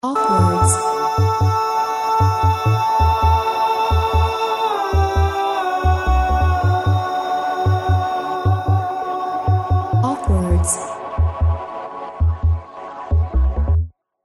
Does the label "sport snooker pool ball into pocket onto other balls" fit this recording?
No